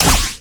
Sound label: Ball Flash; orianna